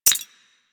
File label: Greed Point Drop; UI; Pickups; Warframe